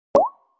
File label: Email, Nokia alert tones